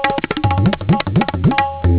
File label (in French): frapper